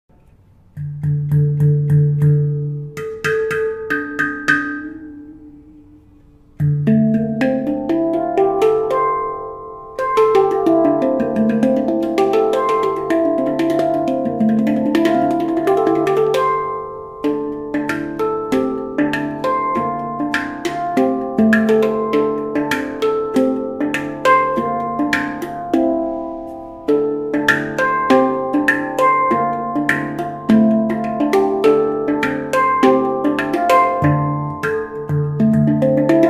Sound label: handpan 10 notes d minor; burgundy